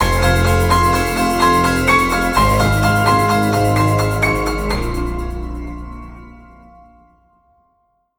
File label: Stinger Stalk; Level 03; Dead by Daylight